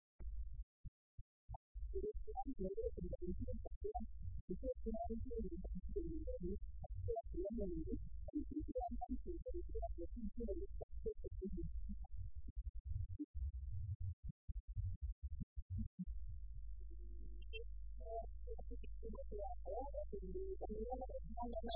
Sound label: ovambo, interval signal; nmb; nbc